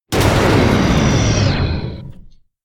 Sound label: Heavy Hard Metal Resonant Impact W Slowmotion